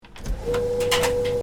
Tumble dryer on, Tumble dryer (domestic): switched on; Laundry; Household